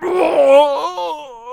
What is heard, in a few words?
Healer Death Vocals